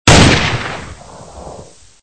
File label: snipe